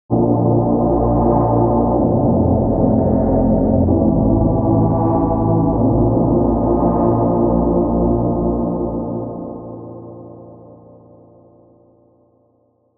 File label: Loop Bass